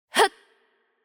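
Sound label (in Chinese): Attack Light, Chinese; bloom minigame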